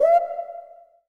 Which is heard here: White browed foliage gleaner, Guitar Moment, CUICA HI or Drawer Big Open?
CUICA HI